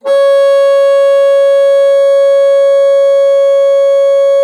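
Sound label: bassoon forte C#5; Xsample VOL-6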